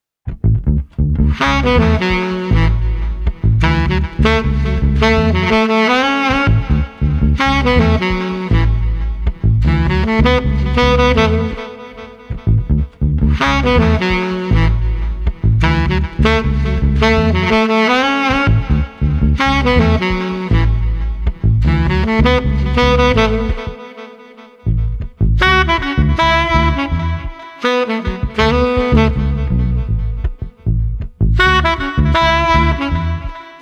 Sax Bass Smooth no Drums 80

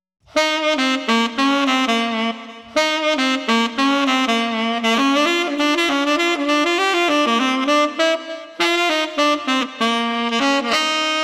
Street Tenor Sax Partytime 100

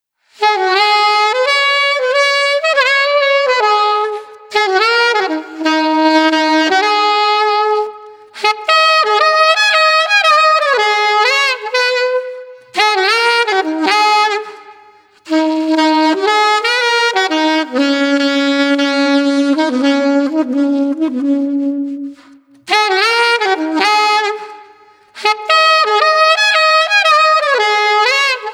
Sax Sultry 120

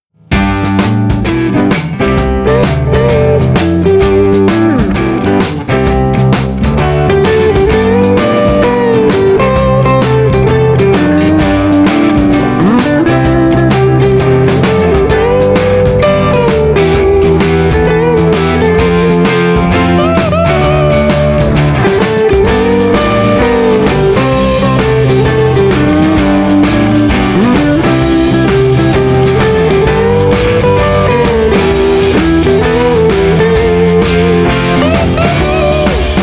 Blues Rock HappyBar Instr 130

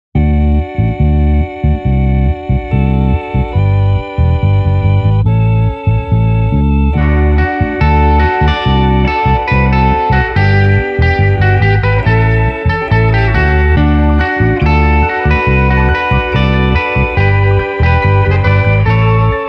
Tropical Swells No Drums 141

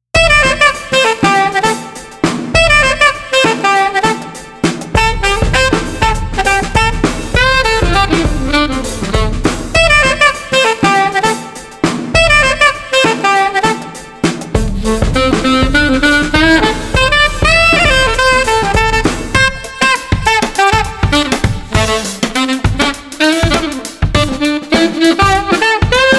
Street Alto Sax Drums Groovin 100

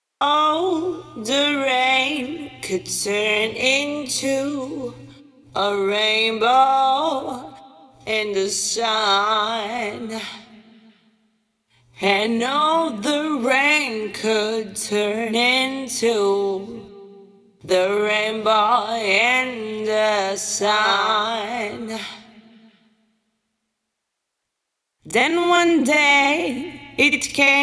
Vocals Only

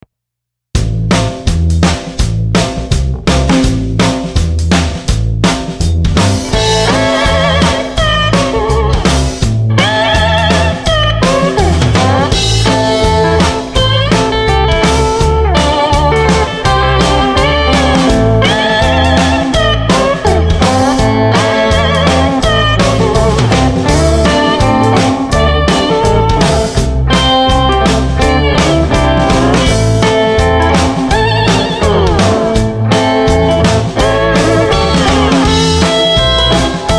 Blues Rock 166

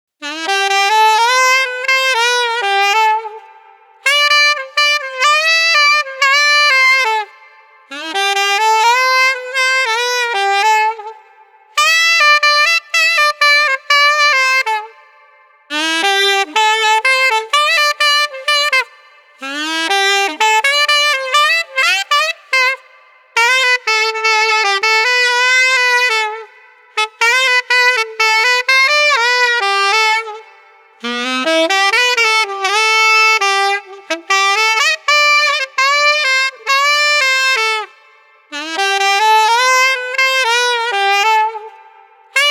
Street Alto Sax Humid 125

